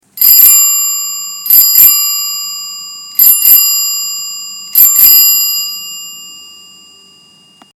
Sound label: bicycle bell ring tone; toronto; himy syed; toronto bike mayor